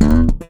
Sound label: FUNK BIT, Bass; Masterbits CLIMAX 7 - Bass; KEN SMITH BS